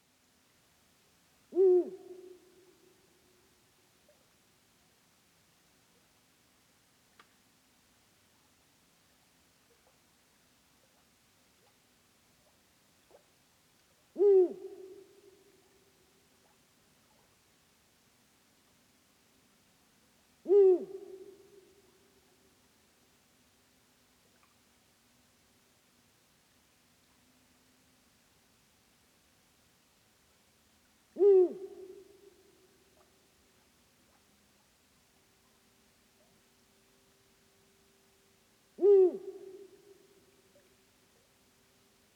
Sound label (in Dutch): Oehoe Bubo bubo